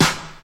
studio hip hop snare drum sound E key, snare drum sound tuned to the E note